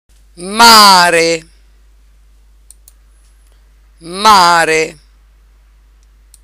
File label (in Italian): mare